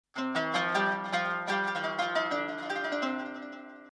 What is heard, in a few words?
Kanun